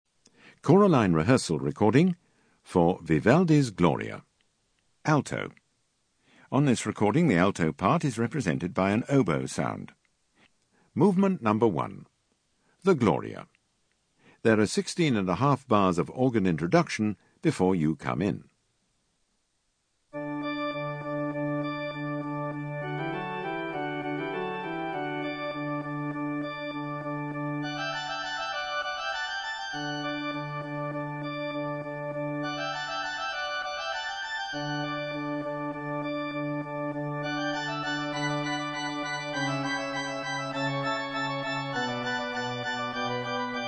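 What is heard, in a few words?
Alt, alto; Vivaldi Gloria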